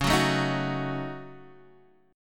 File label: Gb Minor 6th Db